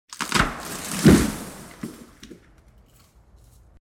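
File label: huge branch fell, echo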